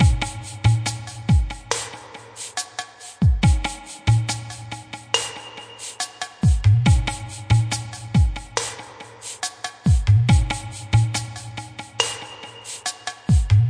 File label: 140 bpm, ambient india tabla groove